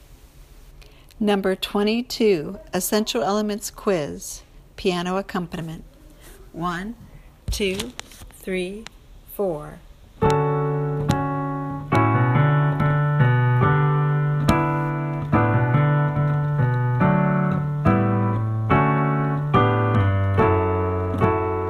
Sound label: piano